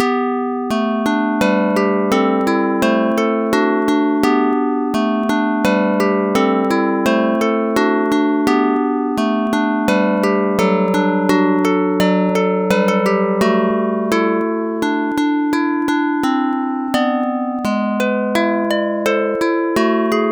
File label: sitar; bach